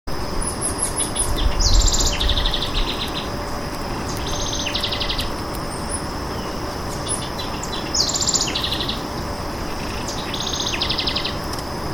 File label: House Wren Costa Rica